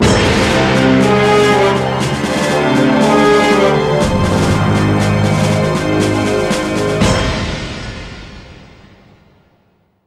Music Military Sting

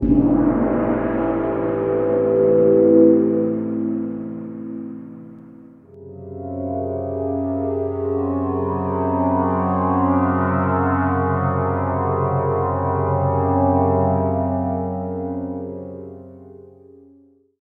Music Submerged

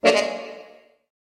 Music Game Attack

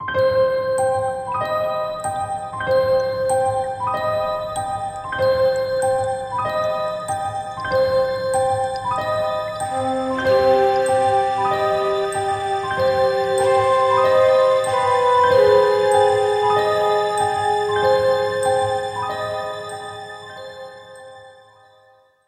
Music Game Haunting

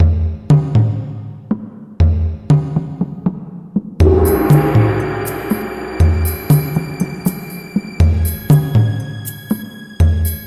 Music Game Exploratory Sting

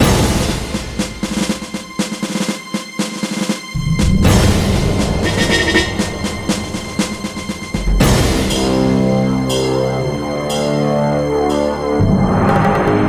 Music Game Military Sting

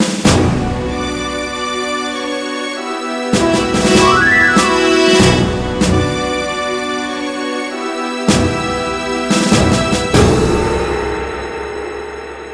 Music Game Military Sting

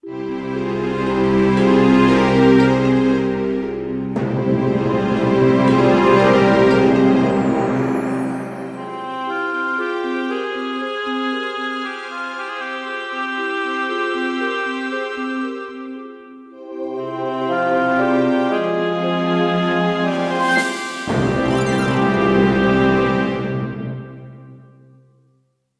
Music Game Egyptian Mood

